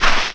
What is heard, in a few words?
step long grass; mario 64